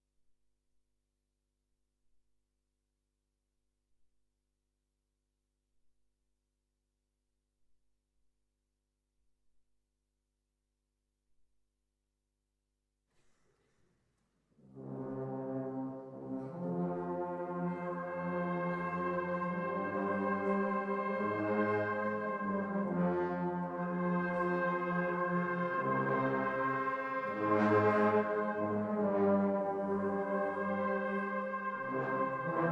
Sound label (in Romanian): Fanfara